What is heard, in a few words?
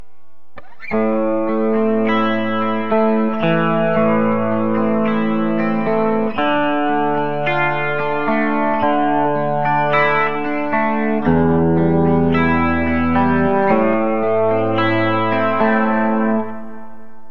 evolution bridge clean specpick; burny; fugly clips